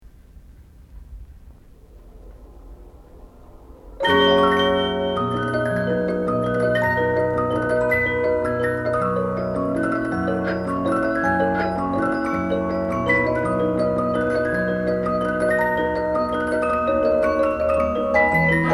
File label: Music Boxes